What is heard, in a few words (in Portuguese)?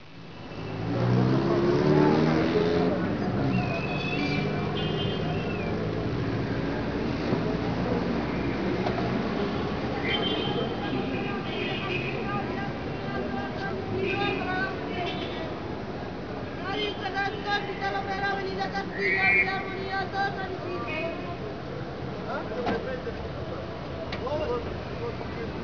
rua, ambience; cstrike